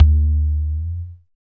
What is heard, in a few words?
LO TABLA; East West - Phat & Phunky